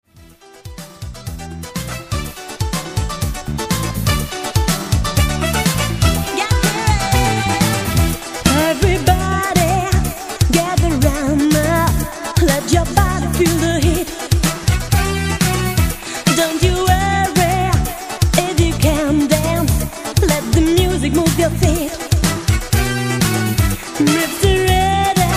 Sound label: conga; cover